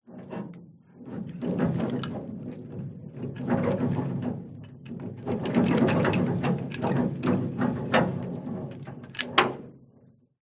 industrial chain movement rattle shake clunk against hollow metal